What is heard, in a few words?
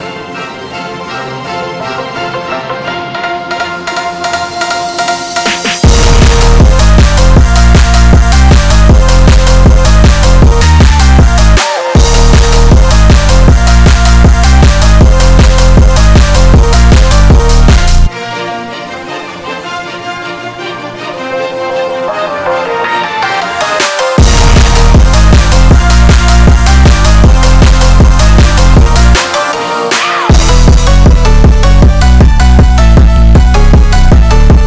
Phonk